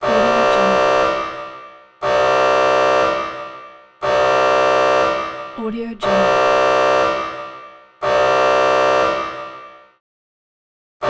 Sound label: emergency warning alarm